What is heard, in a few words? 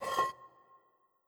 Jar Slide